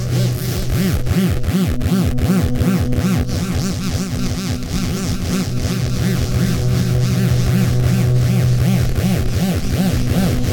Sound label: Tension 120bpm, Ambience